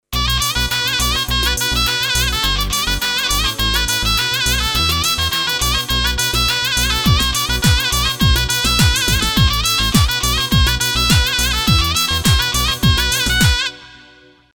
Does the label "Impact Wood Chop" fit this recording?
No